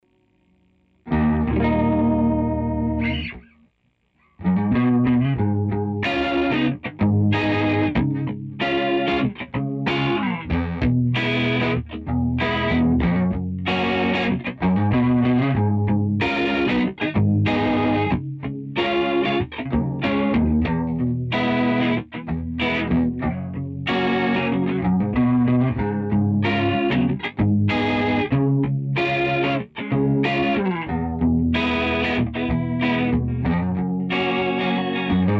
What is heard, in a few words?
Rotary Effect